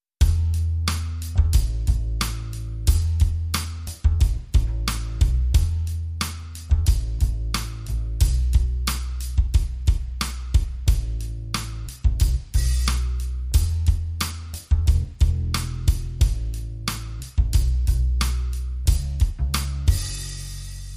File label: Drums Bass